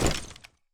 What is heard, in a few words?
land; Counter-Strike Global Offensive